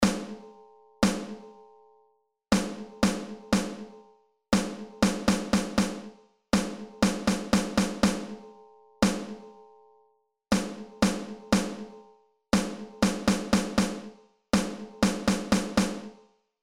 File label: Rhythms Snare Drum